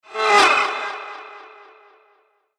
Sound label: Sharp Metal Whoosh; Whoosh Sound Effects; accent sfx for whoosh, wherever swish, sweep and swoop sounds are required; Andrew Fleming